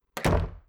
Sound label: SFX Door Wooden Close; this is the zodiac speaking 2020 gamerip switch ps4 xbox one pc macos